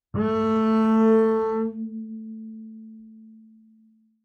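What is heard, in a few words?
Bass arco fortissimo sul A A3, Double Bass; MIS Pitches - 2014; Strings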